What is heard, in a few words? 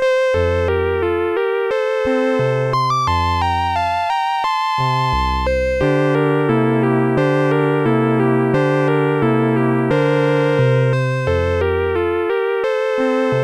whistle; Sonix Music Driver